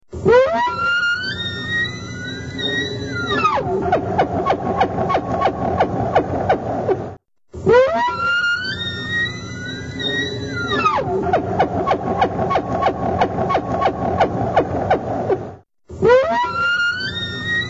Elk; Kentucky Fish Game

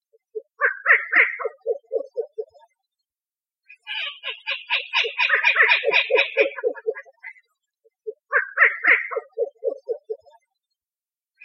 Rufous Legged Owl; Juan Mazar Barnett

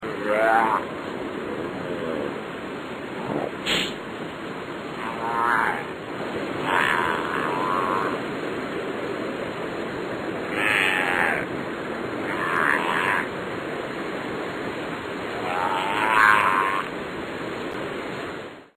Hawaiian Monk Seal

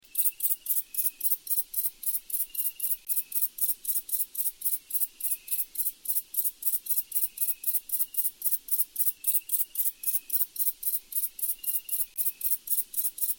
Banza Katydid; Hawaiian

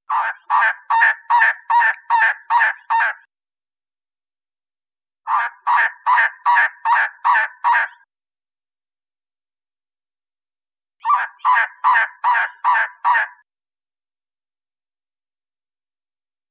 Elegant Trogon; ASU; Ask A Biologist

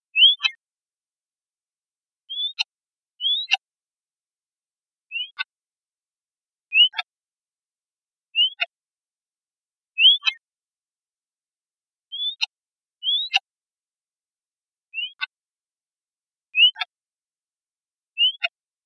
White tailed Kite; ASU; Ask A Biologist

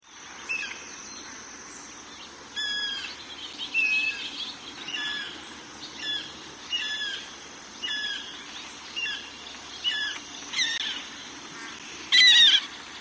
Hawaiian Hawk

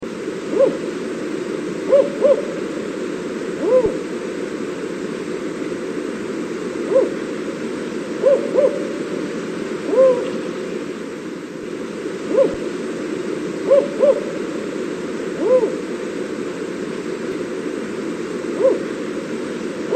Mexican Spotted Owl; Kevin J Colver

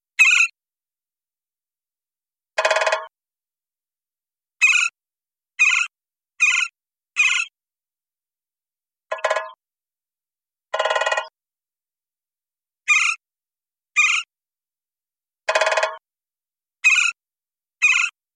Gila Woodpecker; ASU; Ask A Biologist

